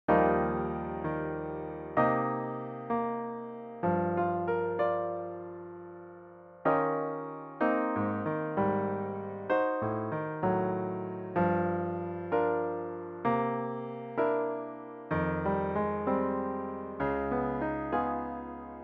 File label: solo piano